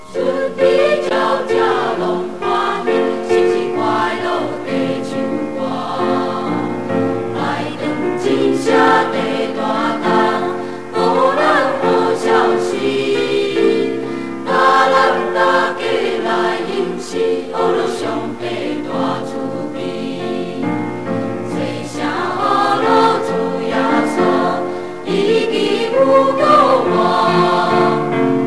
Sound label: Loud Bell; 頌讚曲